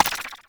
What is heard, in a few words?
UI Valance Mod Swap